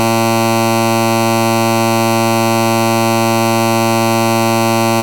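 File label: sounds beep beep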